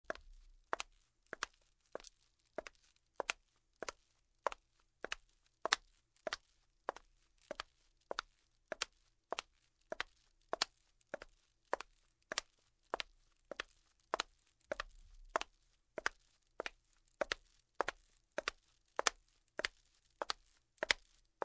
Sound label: Steps on the marble floor in shoes